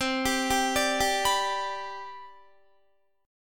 C Major 7th Suspended 2nd, C, down, Guitar; CM7sus2 arpeggiated

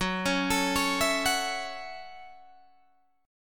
F sharp Minor 7th F sharp, Guitar Open D

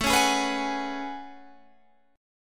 A Major 13th, down Guitar Half Step Down

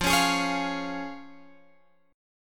F Minor Sharp 5th, Guitar Drop D, chord

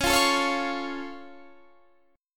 C sharp Augmented down Guitar Standard, chord; strummed; JGuitar

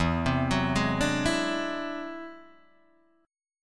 E Augmented 9th E down Guitar Open D

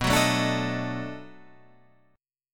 B 7th Sharp 9th, down

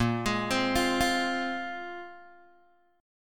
A sharp 7th Suspended 2nd down Guitar Open G

